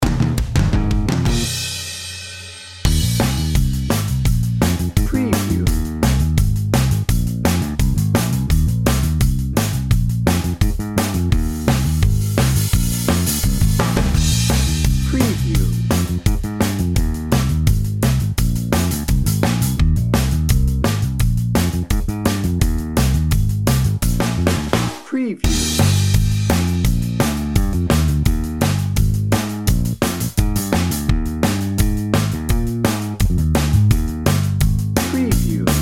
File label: ska party dnb